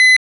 Aircrafts Cockpits Ka50 Avionics Warn Tone Hi; DCS

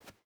footstep hide carpet jump start; Rust

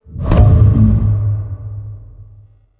spawn npc

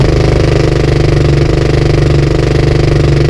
Automatic GSh301 In, Weapons; DCS

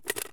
drum pickup; Escape from Tarkov

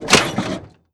snowmobile suspension light

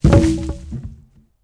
pole falling; World of Tanks Blitz

